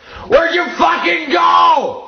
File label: YELLING